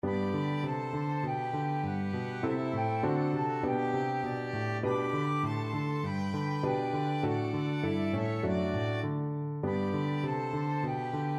melody, flexible ensemble and piano 3 players and piano